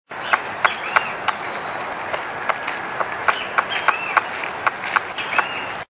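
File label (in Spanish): voz carpintero real picoteando tronco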